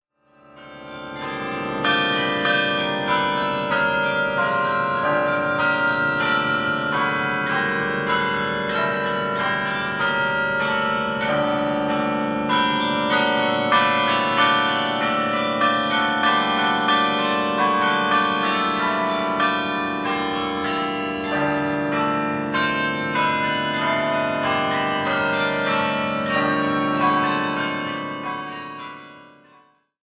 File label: Last Rag, carillon; Range: 3.5 Octaves; Publisher: GCNA